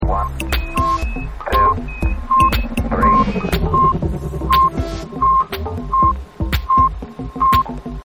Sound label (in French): espace vaisseaux fusee decompte asteroide planete son musique ambiance; youri garine